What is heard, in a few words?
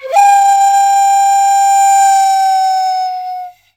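China flute phrase; Spectrasonics; CHINAFLT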